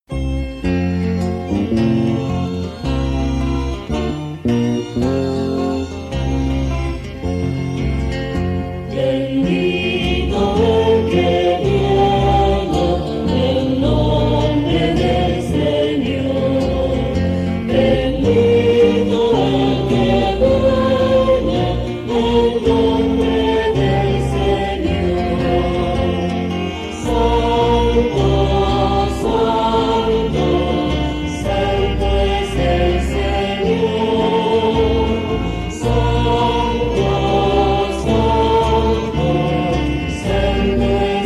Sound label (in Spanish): Domingo de Ramos Canto Procesion Entrada